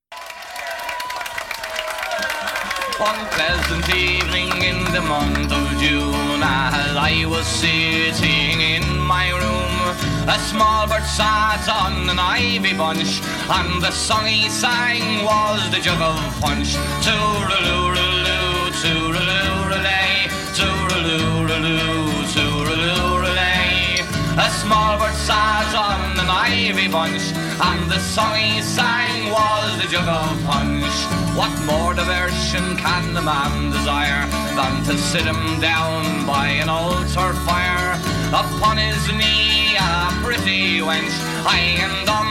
drinking party

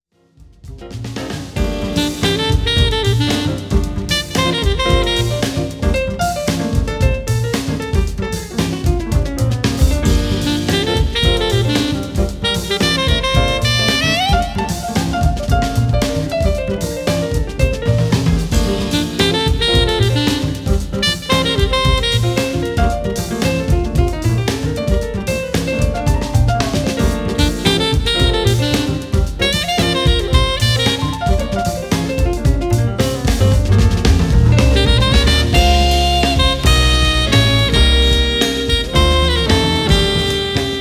Hip Swing

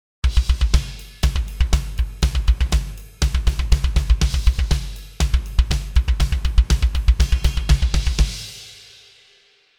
Drums Processed

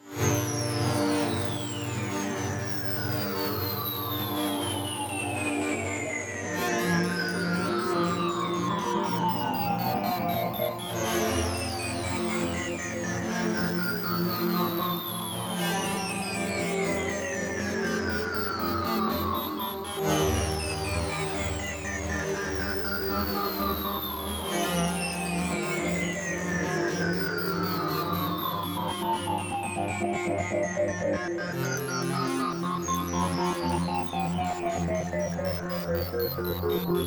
ambient

